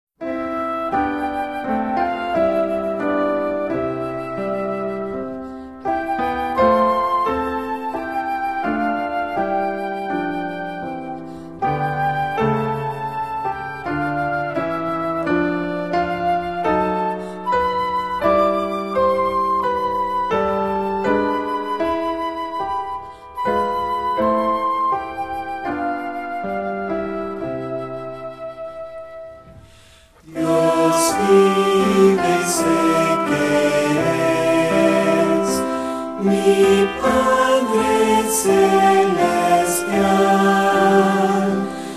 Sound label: vocal and instrumental, hymns spanish